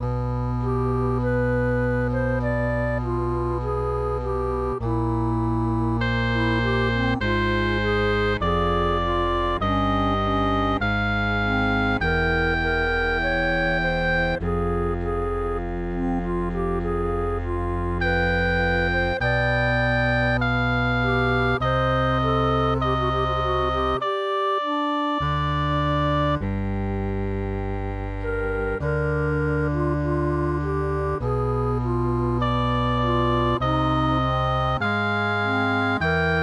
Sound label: MIDI